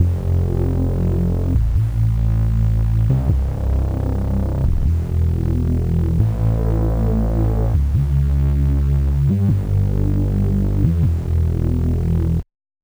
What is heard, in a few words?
bass 155bpm